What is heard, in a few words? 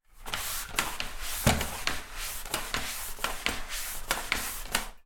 household mop pad mopping bathroom floor